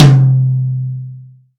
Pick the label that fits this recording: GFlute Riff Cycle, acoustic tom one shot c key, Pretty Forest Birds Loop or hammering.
acoustic tom one shot c key